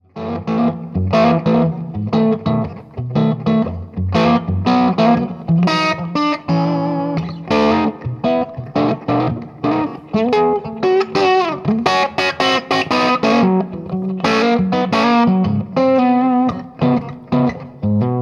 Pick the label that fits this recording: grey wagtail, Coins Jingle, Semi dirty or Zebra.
Semi dirty